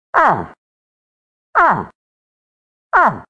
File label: Mature Bull